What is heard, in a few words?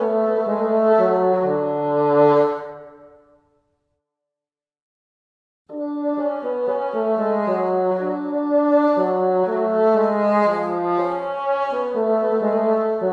bassoon